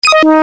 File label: Brass synth; Effects